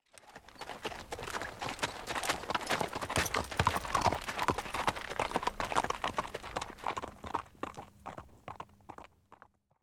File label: Horses; cinematics; Age of Empires III - Complete Collection; Microsoft Studios